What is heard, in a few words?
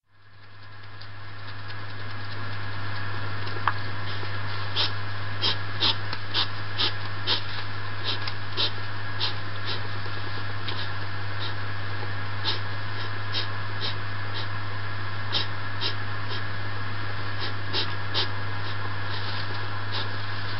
Back garden hedgehog